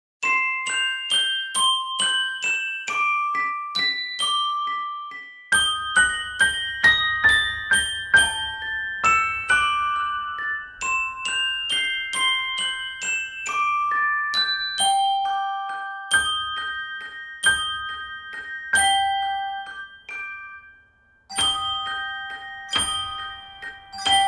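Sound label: elevator music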